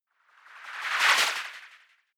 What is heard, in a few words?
whoosh simple flutter futuristic high